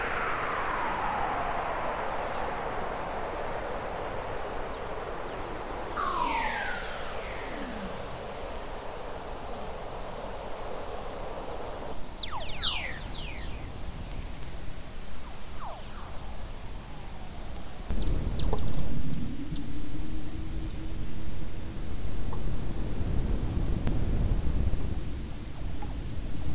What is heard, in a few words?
WFR audio emfisis